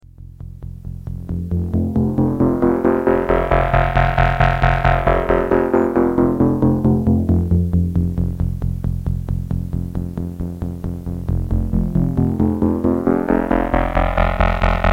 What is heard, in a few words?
soft symmetry sweep